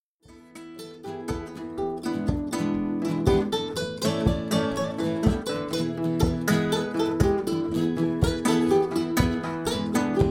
Trio Blues Klopfblues

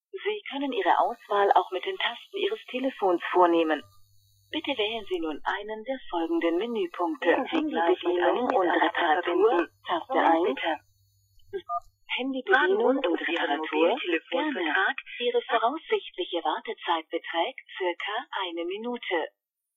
SOLO Warteschleife

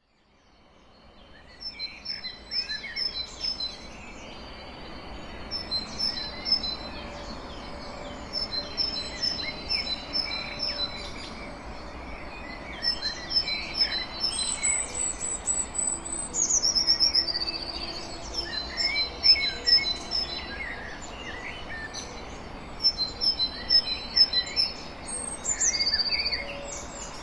Vogelstimmen, 6 Uhr

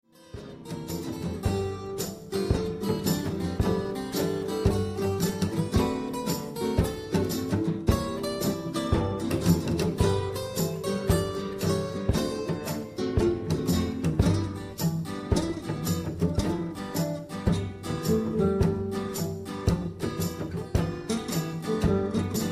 Trio A blues cello blues

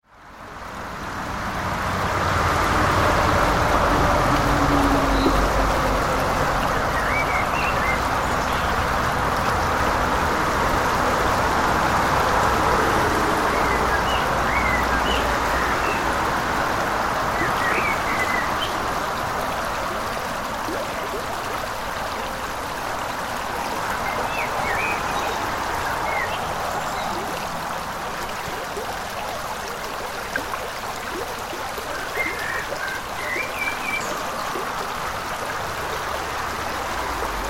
O Ton Stau; Westring

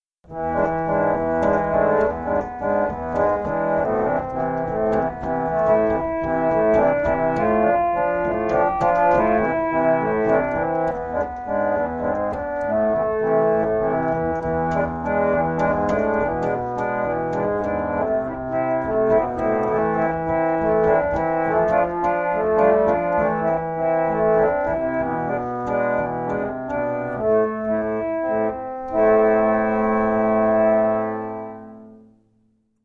SOLO Harmonium

